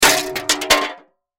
Shovel Drop Spade, Shovel Drop Spade Toss On Cement; Yard Tools (Sound Effects:Tools); Blastwave